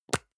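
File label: deodorant can lid open medium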